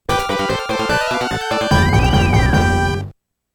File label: 1-Up; Sonic the Hedgehog 3 & Knuckles